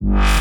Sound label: wobble